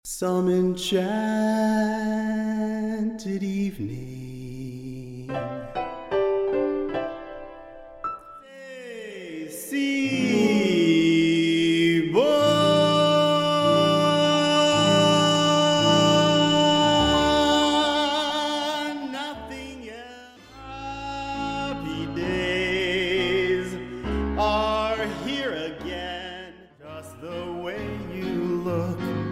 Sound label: Singing English